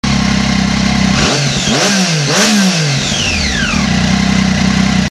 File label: daytona 675, triumph